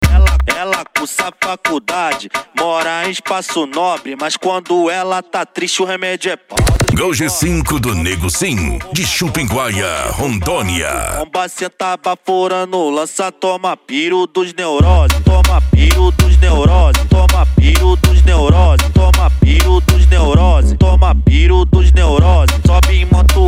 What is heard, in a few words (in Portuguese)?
funk mega bass e noia dance